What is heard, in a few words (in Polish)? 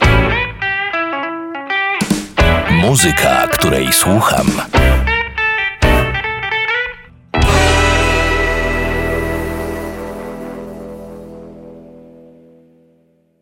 muzyka jingiel